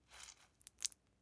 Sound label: water or blood dribble; prosoundfx